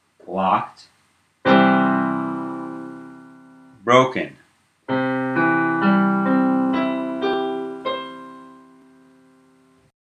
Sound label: Blocked Broken